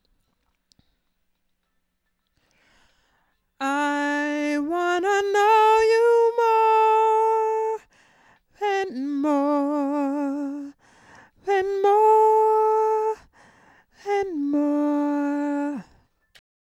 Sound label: diva